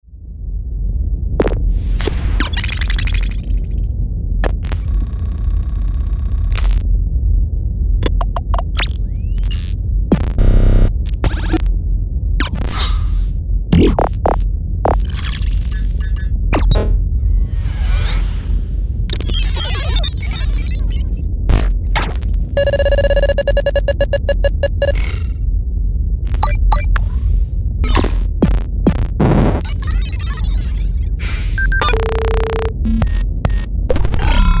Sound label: Futuristic User Interface Sound Effects; Bluezone